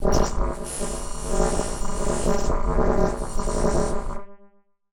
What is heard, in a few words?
Casio, Glitched, malfunctioning synth